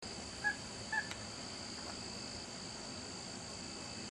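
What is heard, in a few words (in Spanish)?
macagrande, Macá Grande, Podiceps major, Great Grebe; cantos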